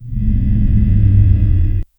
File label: low hollow ghostly moan